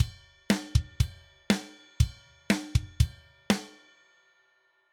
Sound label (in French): drums sans effet